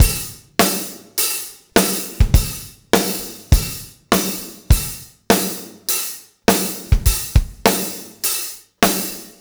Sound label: BACKBONE, Backbeat